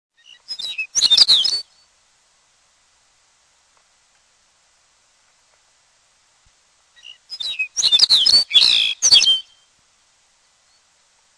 lapland longspur

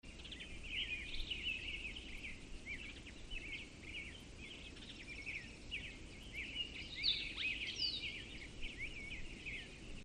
Dawn chorus in Amphitheater

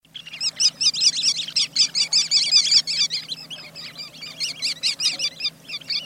Sanderling

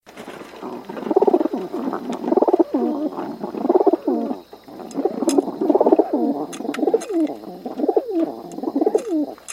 Rock Pigeon

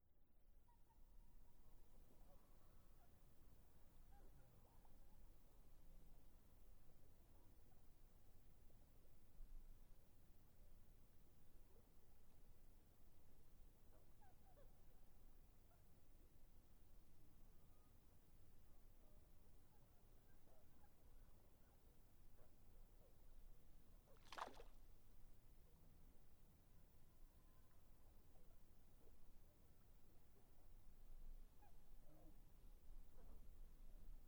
Lake Soundscape

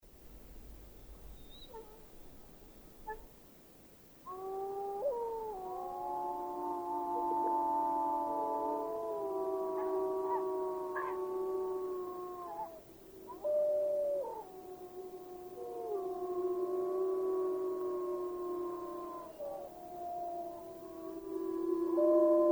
Wolves

